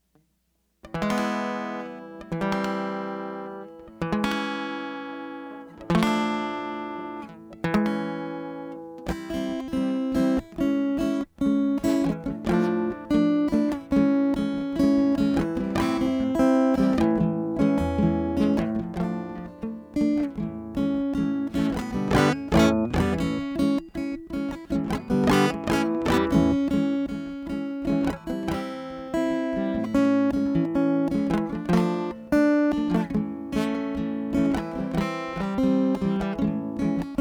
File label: Instrumental